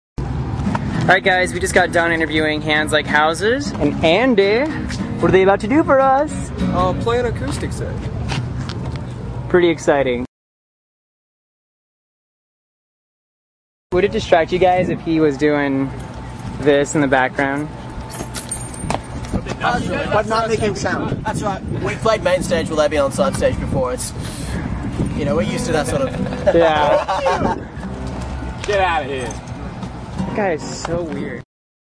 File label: acoustic